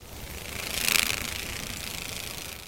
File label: gnatt, insect; ambient